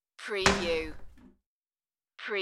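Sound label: Toilet Lid Close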